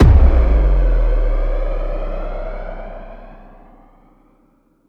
After Burner Hit; Rapture vol1

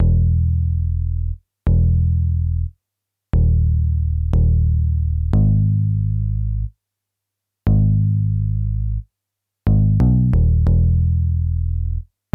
Bass 90bpm No Sidechain

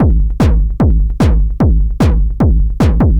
Beat Loop 150bpm

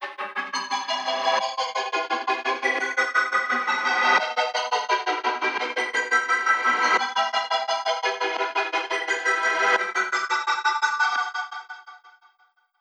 DNB DROP CHORDS CM 172; KULTURE

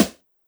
Snare Rhythm 128bpm Dry

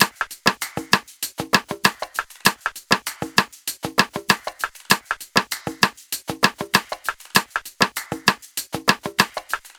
98bpm Drums Top; Exotica

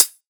Big EDM Closed HH

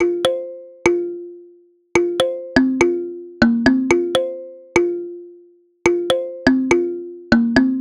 Synth, 123bpm, F, Kalimba

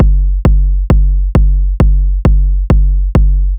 Body KICK; Locked Grooves

